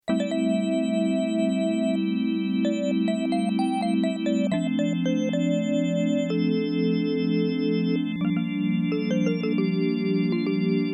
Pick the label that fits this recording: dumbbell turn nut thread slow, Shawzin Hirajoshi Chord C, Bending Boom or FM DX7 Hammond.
FM DX7 Hammond